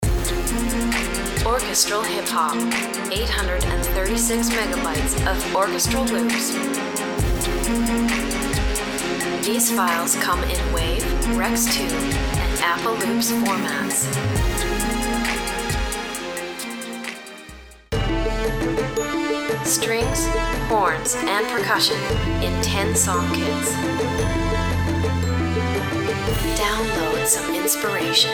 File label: orchestral hip hop